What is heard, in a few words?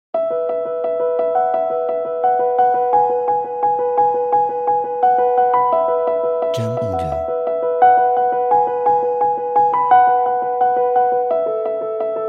Corporate Motivational